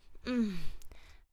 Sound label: Moan